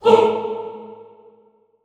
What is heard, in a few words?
HOO A2, Classical Choir SHORT HOO; Best Service; Extended Classical Choir